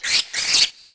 rat; Mafie 1

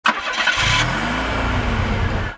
cord on; Mafie 1

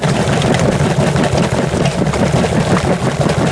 pneu punc; Mafie 1

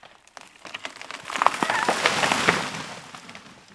tree; Mafie 1

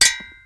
metal; Mafie 1

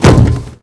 bodyfall; Mafie 1

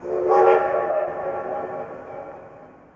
rail; Mafie 1

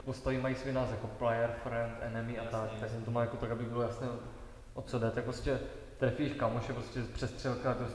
people; Mafie 1

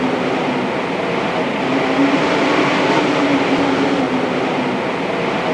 whiff; Mafie 1

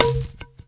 grass; Mafie 1

